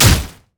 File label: grasping roots; LoL; zyra